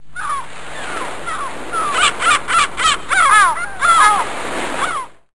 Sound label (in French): Mouette